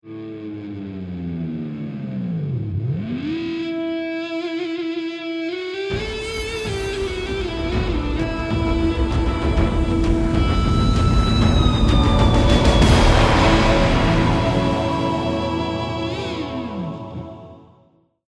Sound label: start round, music; halo